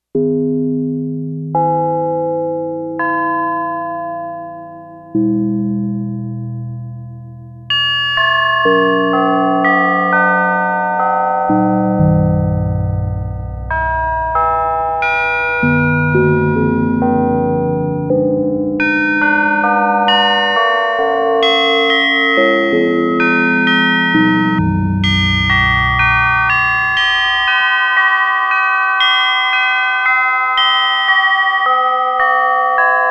Clangorous Bells

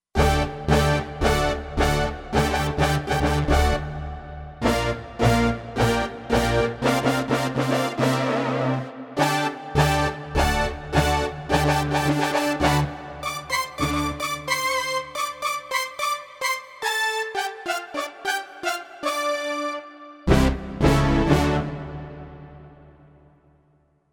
Kurzweil K2500X Pulse Brass

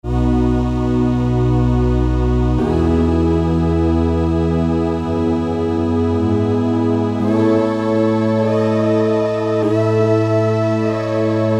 Voices, Korg DSS-1 DWGS synthesizer presets; Korg DSS-1 Sound Library

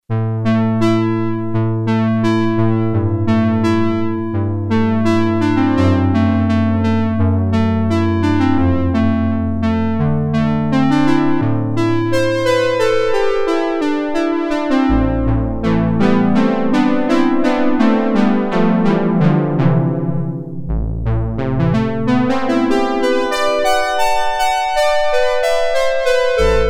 Pulse Width Echo; Sequential Circuits Prophet-5 (Ver.3); Factory Patch; File 2 Programs

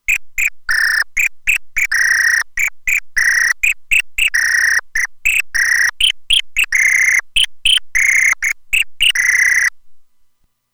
Whistle

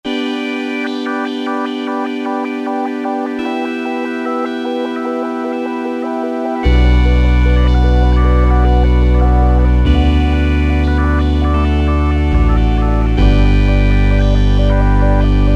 syn Motion, E-mu Orbit V2; Factory presets

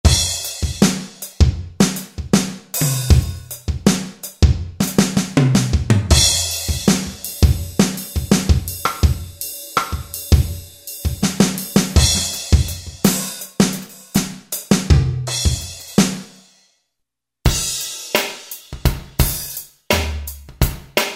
KIT - REAL; Best Service Gigapack

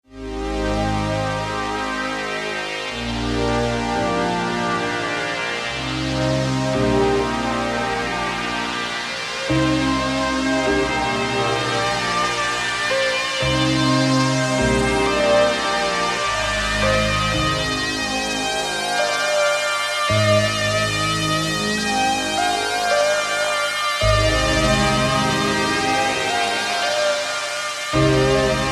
Arp Rise, Kurzweil K2000; Kurzweil K2000 Contemporary ROM; Contemporary ROM Programs and Demos diskette; ANASYNTH

